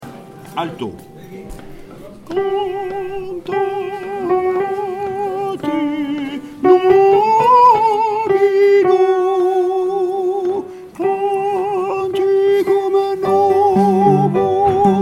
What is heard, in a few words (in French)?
alto; cantate domino